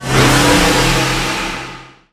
divine angry

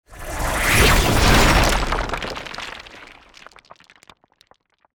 magical rock spell

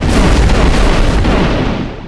shatter

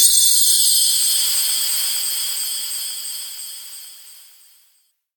sanctuary

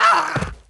die man

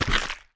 corpse

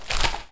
scroll

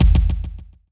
fly down

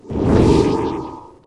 haste

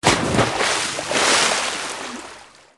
deep water

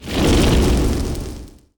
flame

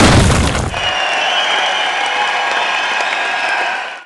blast

